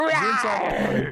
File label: Rawr